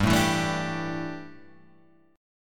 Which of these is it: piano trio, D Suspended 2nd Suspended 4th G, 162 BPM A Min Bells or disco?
D Suspended 2nd Suspended 4th G